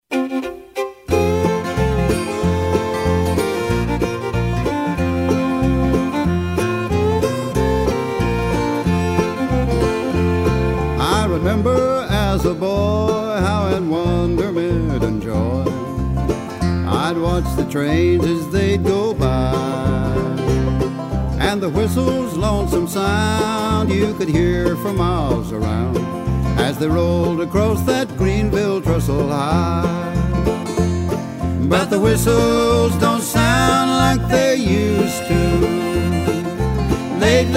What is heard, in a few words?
greenville trestle high banjo; tab